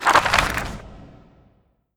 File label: page turn